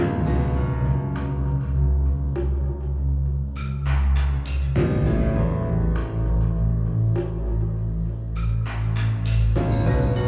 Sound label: wilderness calm swamp, music